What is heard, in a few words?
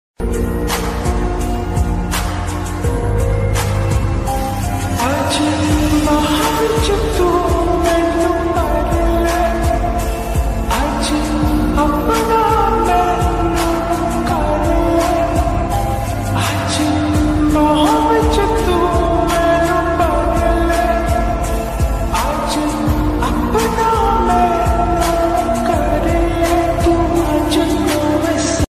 hindi ringtone love ringtone punjabi ringtone song ringtone